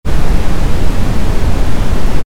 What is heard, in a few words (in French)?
bruit brownien